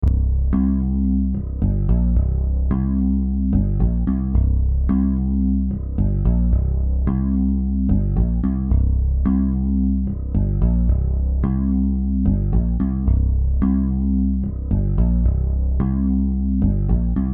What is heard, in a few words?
Bass Solo; Waves CLA-2a